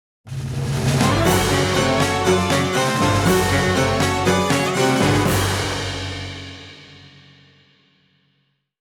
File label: National Anthem; Advance Wars 1+2 Re-Boot Camp; Black Hole